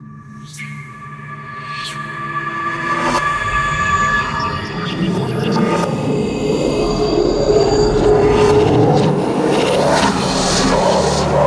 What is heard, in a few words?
dark atmo; sonniss gdc